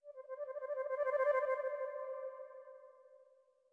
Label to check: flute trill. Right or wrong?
Right